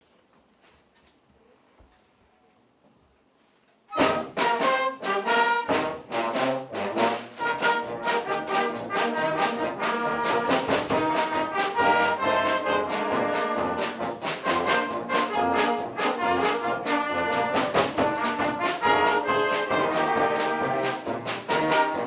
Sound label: Polka